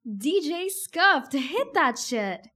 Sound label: dj scuffed hit